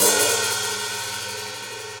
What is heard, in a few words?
Hihat Brush Open ClubSizzle; Drum Hits; Standard Hits; Ableton; Factory Packs; Session Drums Club